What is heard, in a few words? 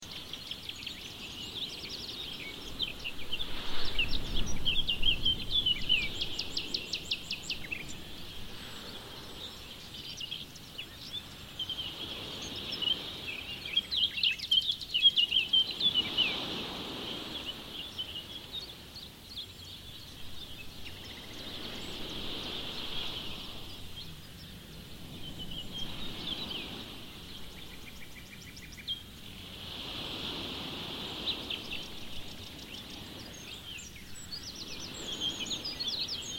near ringstead beach